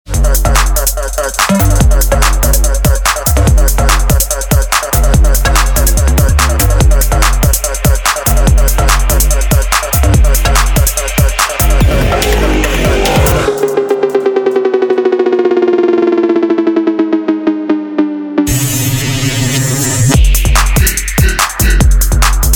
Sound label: cowbell